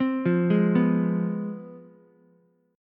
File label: C Major 7th Suspended 4th Sharp 5th, down, Ukulele; CM7sus4#5 arpeggiated; JGuitar